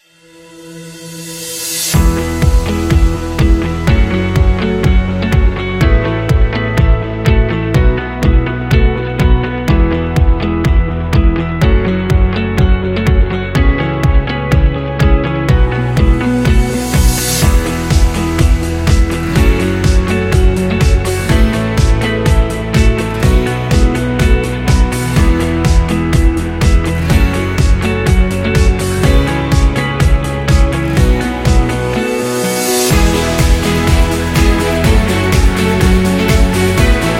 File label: School Corporate